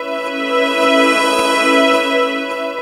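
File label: spin cycle, ambient washes; Spectrasonic Distorted Reality 1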